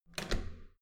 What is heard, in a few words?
metal door heavy handle down